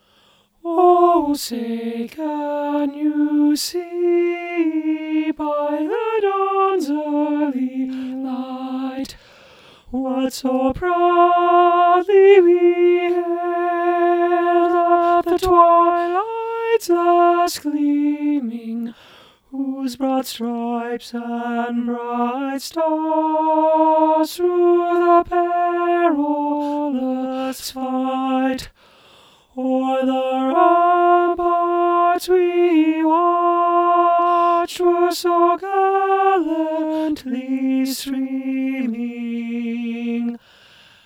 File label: alto low